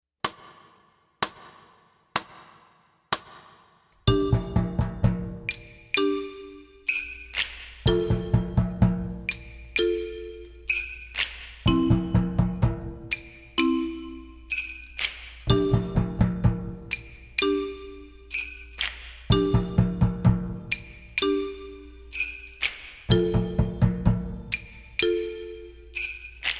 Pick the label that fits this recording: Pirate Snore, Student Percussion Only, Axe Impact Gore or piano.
Student Percussion Only